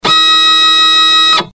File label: servo action style